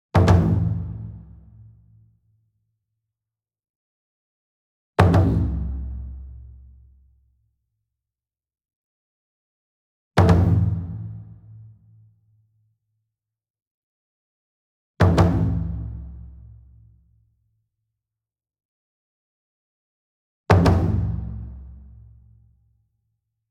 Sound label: SINGLE DRUM Taiko 28 Inch Double Soft Beater Medium; boom library; Cinematic Strikes Construction Kit